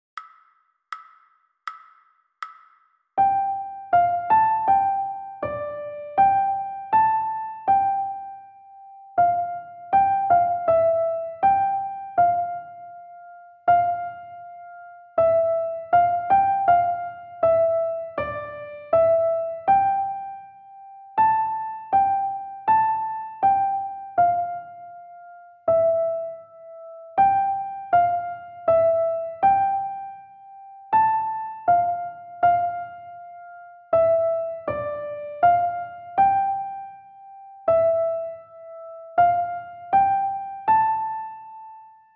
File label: Two Studies - slow, recorder; Linde